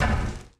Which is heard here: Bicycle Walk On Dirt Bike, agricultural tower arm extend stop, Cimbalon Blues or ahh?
agricultural tower arm extend stop